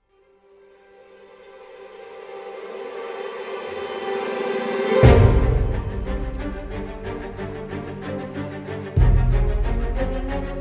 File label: Orch